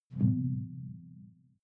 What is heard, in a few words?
sci-fi alien bloom soft; swooshes-swishes